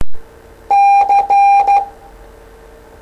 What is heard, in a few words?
Morse C